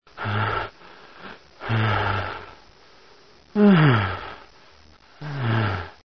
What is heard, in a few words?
pant